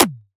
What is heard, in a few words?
Perc; Sample Banks; Percussion